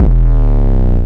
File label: Chain Bass; M8